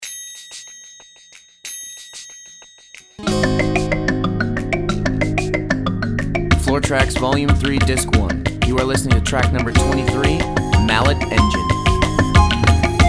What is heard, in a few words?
Mallet Engine